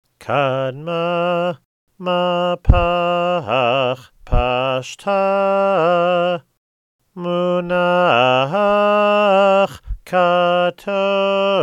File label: Torah Trope Haftarah Katon